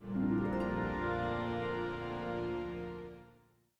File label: Deception End 50bpm; Music Dialogue